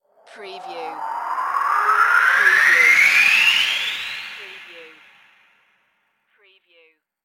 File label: Ascend Slow Whoosh